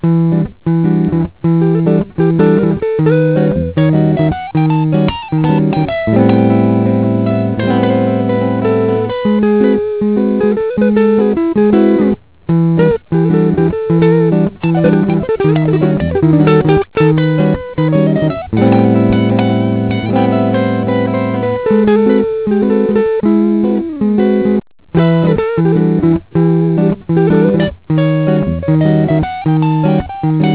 jazz; superlocrio

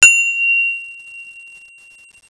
chime

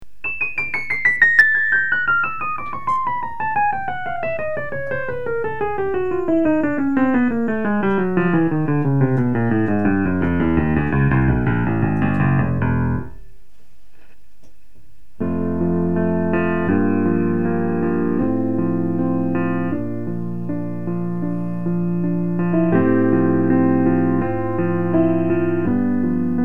piano

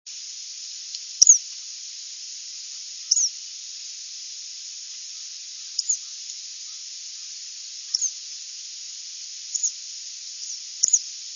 kingbirds flock; birdsongs

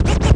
scratch

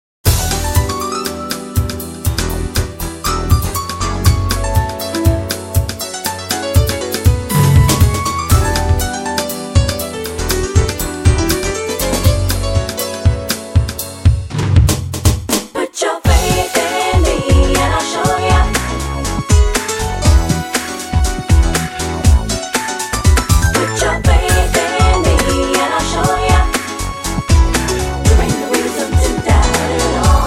jazzy groove

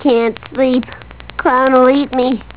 clown; simpsons

